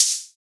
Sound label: HiHat, Percussion; Sample Banks